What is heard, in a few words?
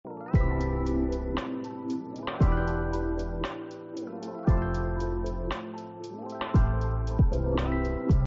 background music